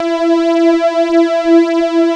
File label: MIDI pad, synth pad; InVision Interactive; Lightware VOL-1; Instruments & Percussions